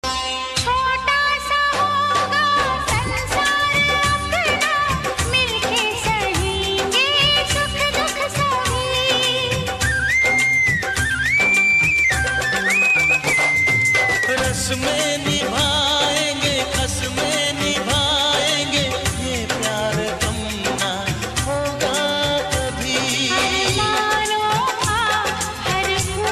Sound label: very sad ringtone